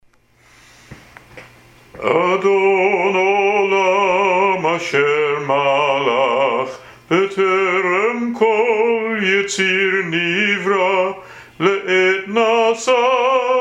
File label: Adon Olam Traditional